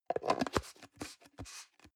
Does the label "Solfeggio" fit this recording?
No